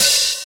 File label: thin open hat d sharp key